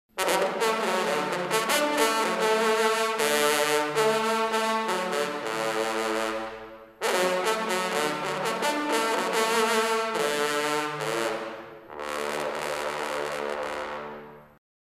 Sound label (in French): solo basse